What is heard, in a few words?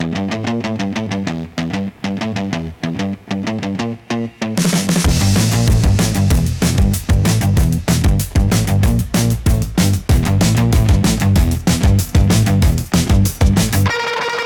Instrumental